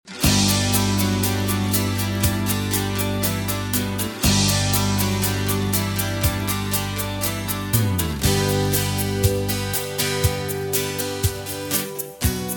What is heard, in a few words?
G No Backing Vocals, backing tracks